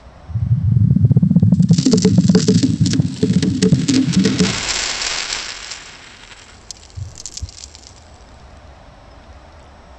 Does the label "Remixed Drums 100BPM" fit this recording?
No